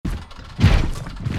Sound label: Walk